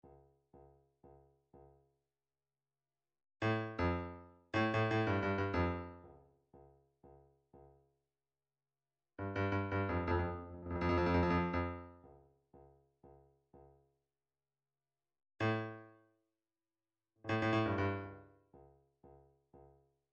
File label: drum trax tom